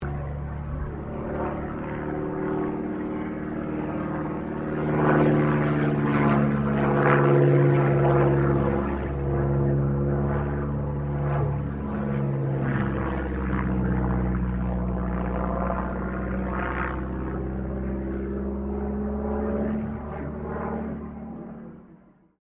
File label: AIRCRAFT DH82A DEHAVILAND TIGERMOTH PASS; 1936 Tigermoth; Airplane Sound Effects; Vehicles